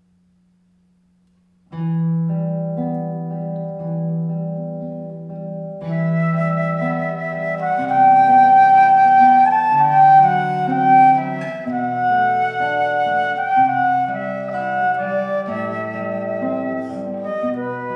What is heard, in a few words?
Pavane, chamber music duo